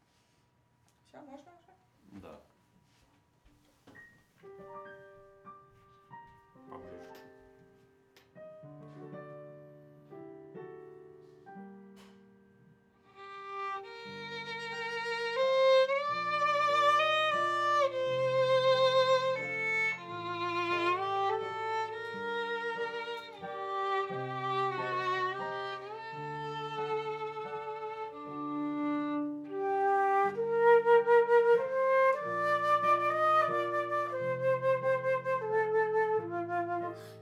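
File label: Viola C minor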